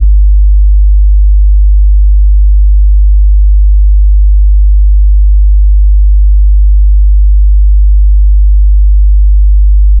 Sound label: 444 hz, tone